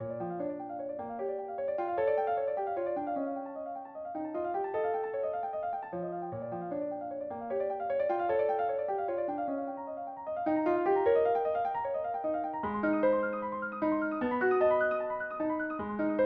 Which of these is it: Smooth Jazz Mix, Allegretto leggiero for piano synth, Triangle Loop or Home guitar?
Allegretto leggiero for piano synth